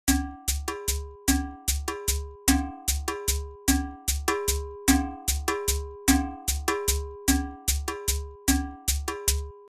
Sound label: African Kit